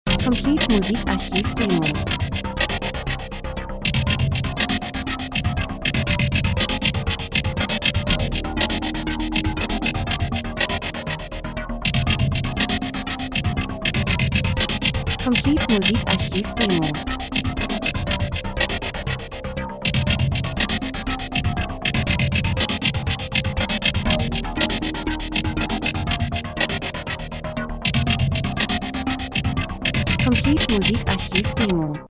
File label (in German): drums, rhythmus; Elektro Spannung düster Gleichförmig Spirale Kalt Urban Autofahrt im Regen Industrie Maschine Dokumentation